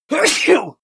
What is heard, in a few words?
sneeze male achoo